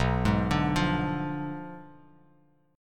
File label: B Diminished 7th B down Bass Standard; Bdim7 arpeggiated